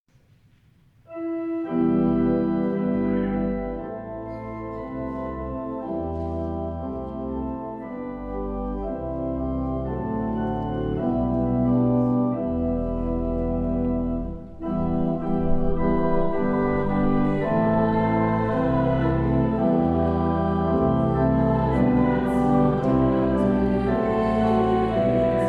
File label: O Come O Come Emmanuel Audience Carol